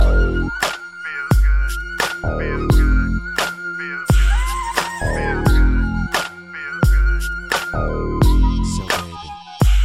rap music; hip hop

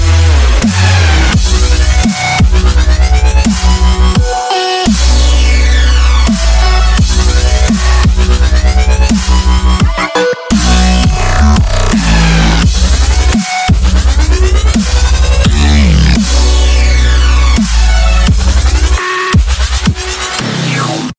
Fractal Electro

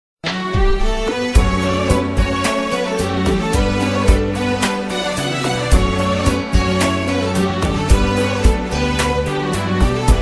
cute music, rock

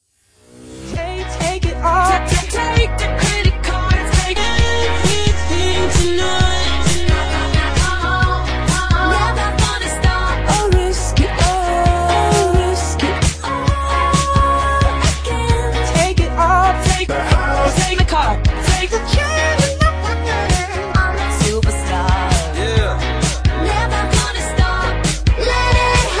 mashup

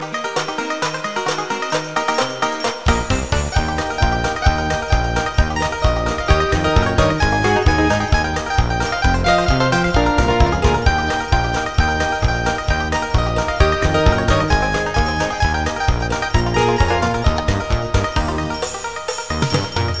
country jingle bells; ringtones

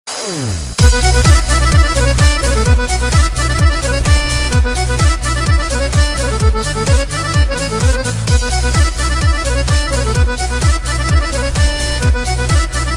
Balkan Harmonika Mix, ringtones

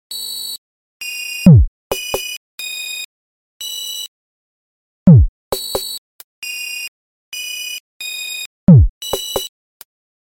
Sound Loop

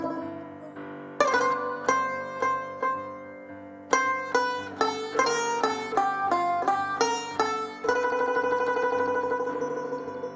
Rubab

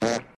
Fart

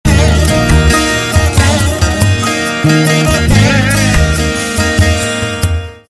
melody msg; ringtones; sms

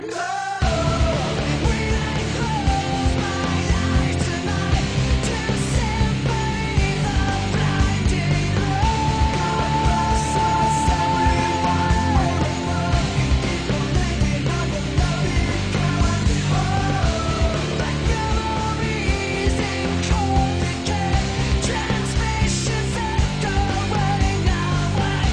old school lp style, rock

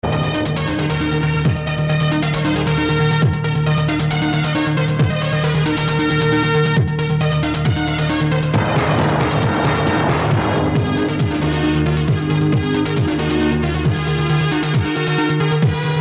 beat, ringtone; blues

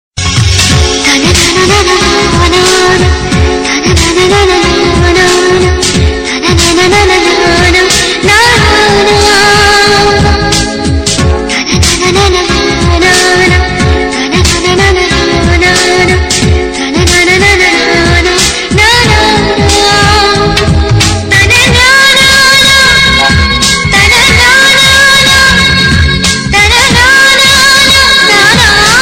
humming

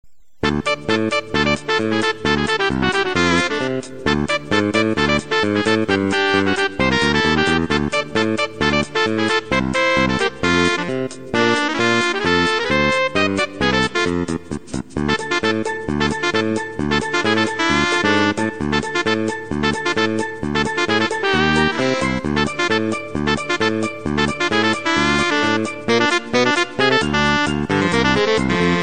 Star Music

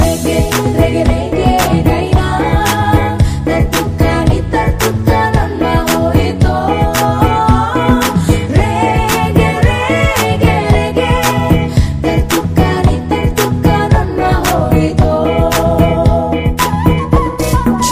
classic batak, ringtones

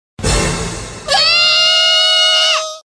Yehhh

